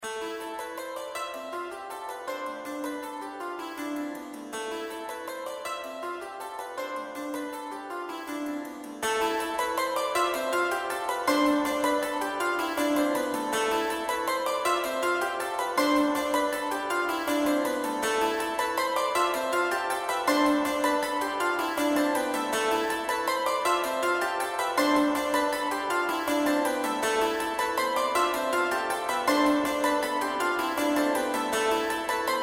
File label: dulcimer